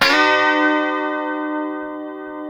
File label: C#4 D funk chord, guitars; Spectrasonics; Hans Zimmer Guitars VOL-1